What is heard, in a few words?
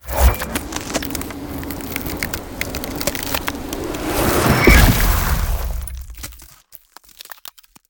Ice Spell Damage Direct Icicle Spear; Arctic Aura